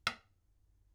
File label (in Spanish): Baqueta ancho, beats with different tools and materials